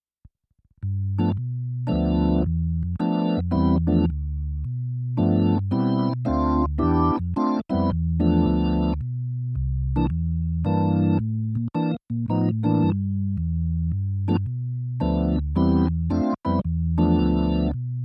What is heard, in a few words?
Electro Clean